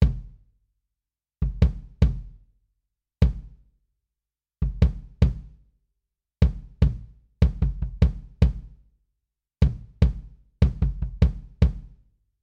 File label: drums kick; brut